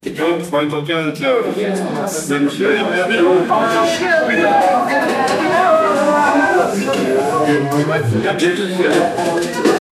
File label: orthodox mass, religious